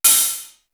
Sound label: CLATTER; East West; Global Sounds; D4 FXS